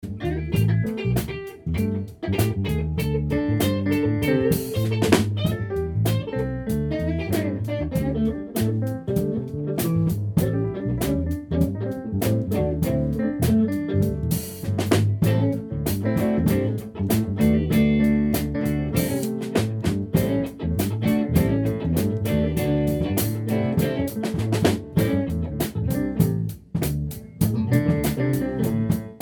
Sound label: Funk